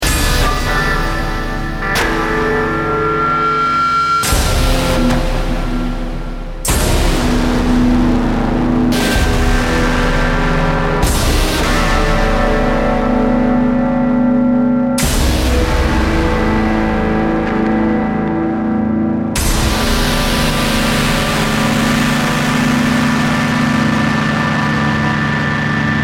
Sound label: Rock Guitar Smash, Sound of smashing an electric guitar on stage.; Hitting; Human